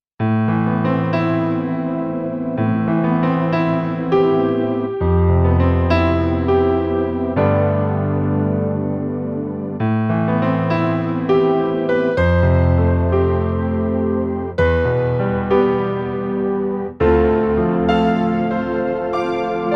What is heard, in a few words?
Electro 6 Royal Grand